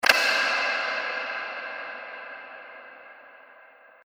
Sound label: Ambient Click Sound